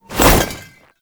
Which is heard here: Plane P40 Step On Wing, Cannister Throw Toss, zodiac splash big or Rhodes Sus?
Cannister Throw Toss